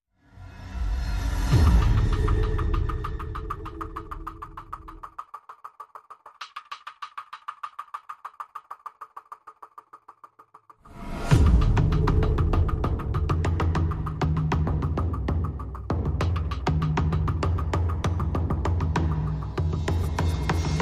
Drums and SFX

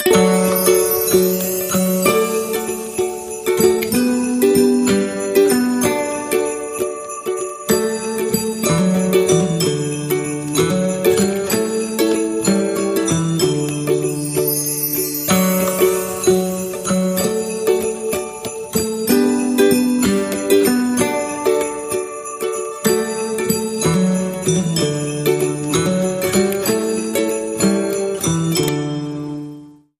Ukulele Guitar

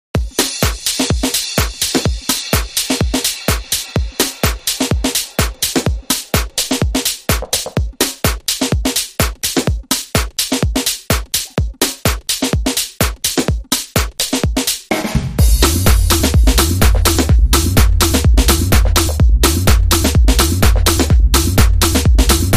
The Snare

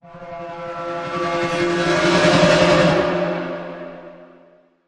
Bizarre Timpani Cres

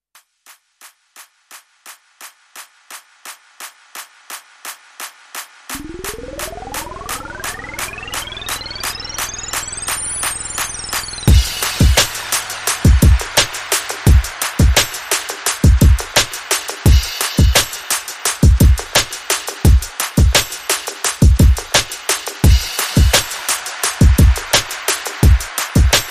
Portable Party Beat

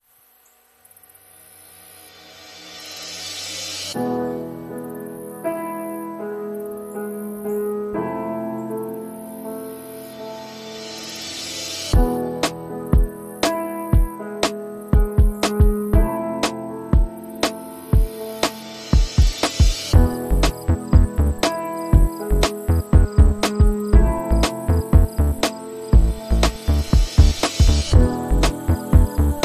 Soft Piano

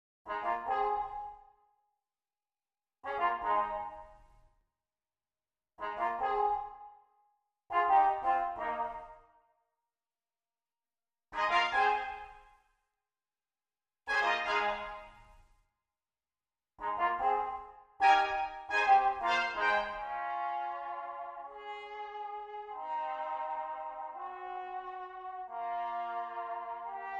Brasses Clean

